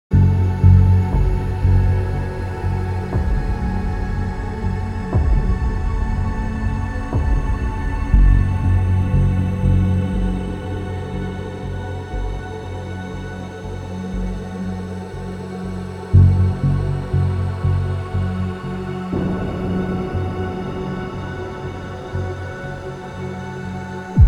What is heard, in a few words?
ATMOSPHERIC FUTURES